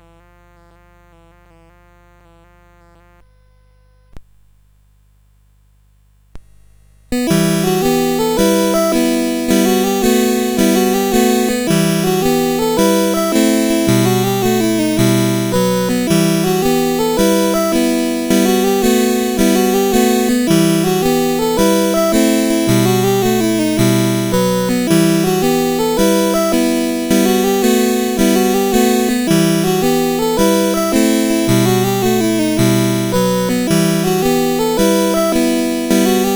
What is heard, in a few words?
CSG8580; There was a Pig went Out to Dig; CSG8580R5